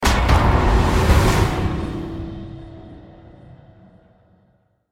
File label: Stinger Action Medium